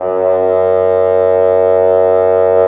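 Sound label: wind bassoon; LA Composer vol. 3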